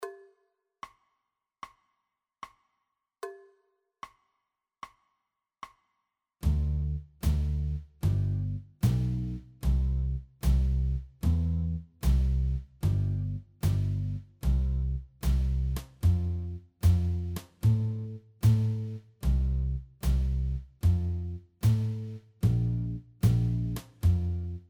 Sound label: TWO FIVE ONES 4 keys